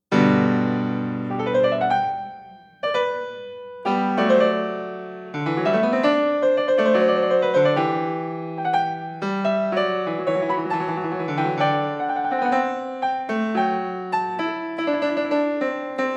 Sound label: Goldberg Variations BWV 988 Variation; Bach